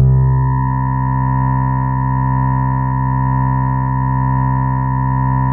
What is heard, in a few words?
Orgsitar C3, Fairlight CMI; Keyboards; Keyboards2